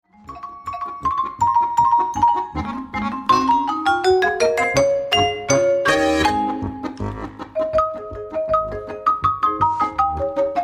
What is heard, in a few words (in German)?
Tritsch Tratsch Polka